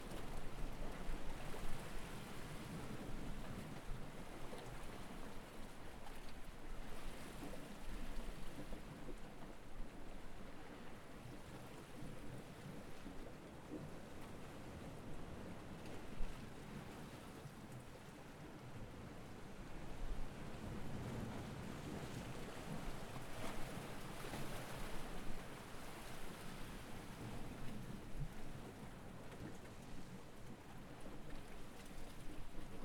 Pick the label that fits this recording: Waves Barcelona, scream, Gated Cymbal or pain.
Waves Barcelona